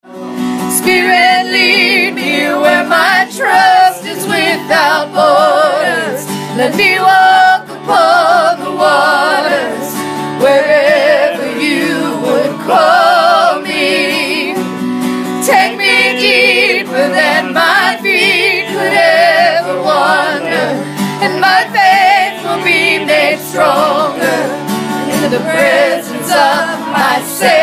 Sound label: Bridge Alto